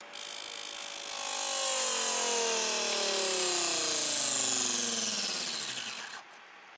Power Down

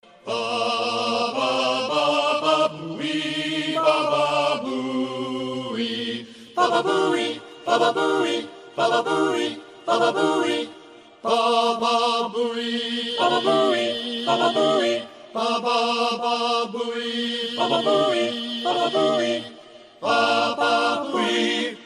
Choir

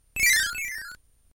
Door Sound; Kirby

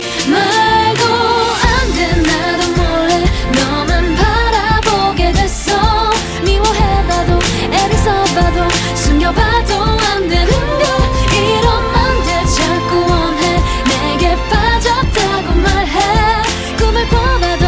iPhone personal

